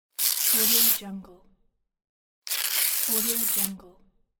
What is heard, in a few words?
paper tear